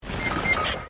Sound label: dead sound effect; sonidos de muerte; Fortnite